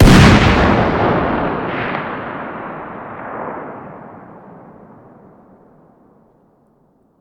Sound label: fire dist 50bmg rif ext; gmod; arccw uc commons attachments